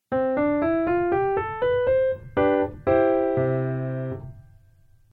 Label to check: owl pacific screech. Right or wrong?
Wrong